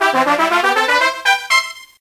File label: Casino Win; Dragon Quest Series